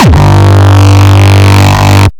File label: kick